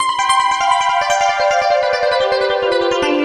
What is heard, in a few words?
Raining Down, Keyboards